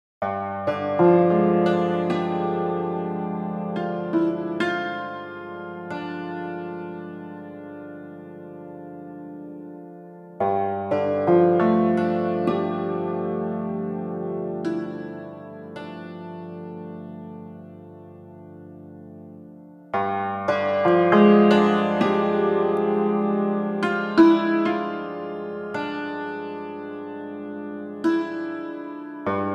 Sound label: Cimbalom